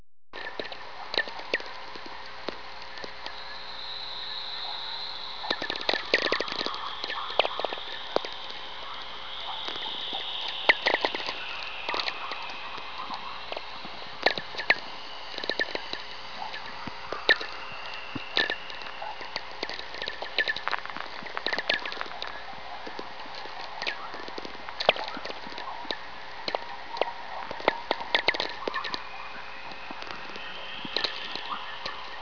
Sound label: VLF auroral chorus, Alberta